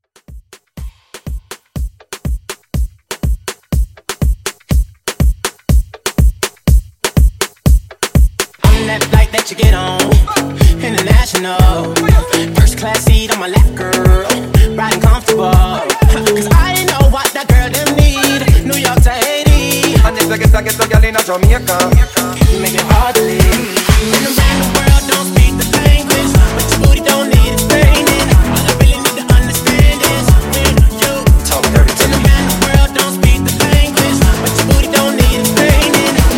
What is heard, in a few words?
Re Drum 122